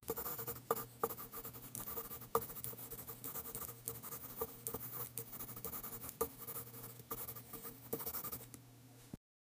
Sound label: writing